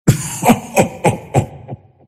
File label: deny pain; Dota 2; earthshaker; arcana